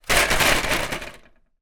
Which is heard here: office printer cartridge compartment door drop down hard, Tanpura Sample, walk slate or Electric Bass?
office printer cartridge compartment door drop down hard